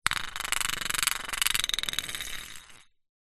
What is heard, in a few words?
leisure toy spinning top plastic on wood